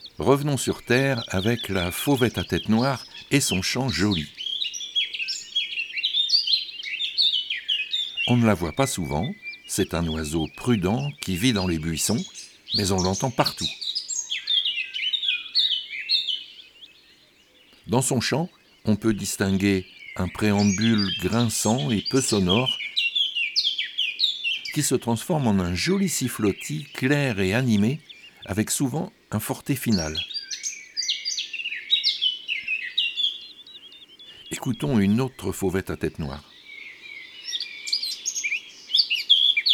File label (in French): La Fauvette a tete noire; chants d'oiseaux